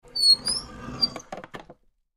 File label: Piano Bench Open, Piano Bench Open Furniture; Musical; Pianos; Blastwave